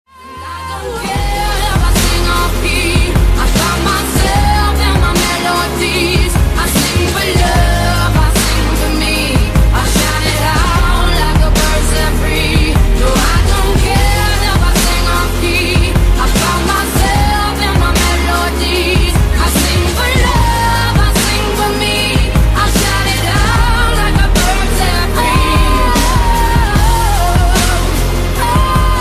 English Song Ringtone

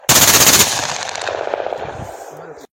Machine Gun Ringtone

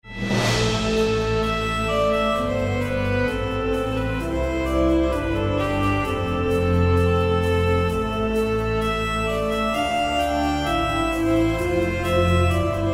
Motorola High Land Ringtone

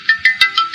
Notification Ringtone; Infinix Hot 10 Play

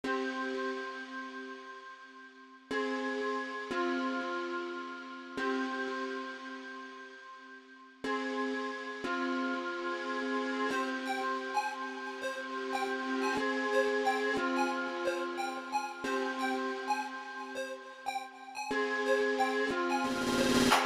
Vivo S1 official ringtone

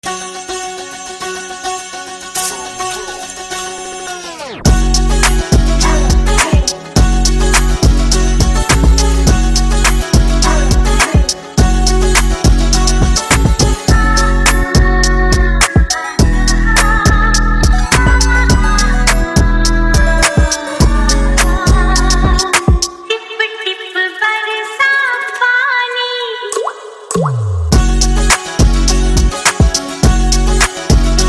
Punjabi Trandy Ringtone

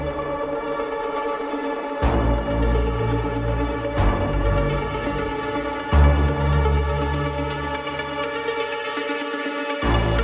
Motorola Moto Razr 2019 Ringtone

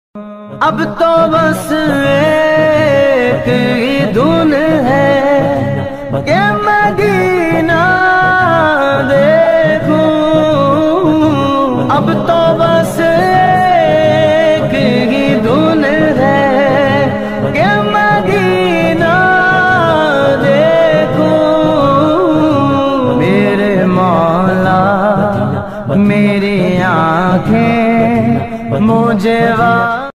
New Naat Caller Tune

